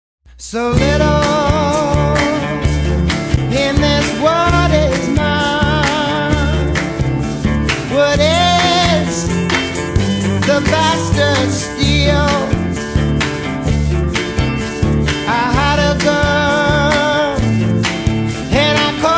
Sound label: blues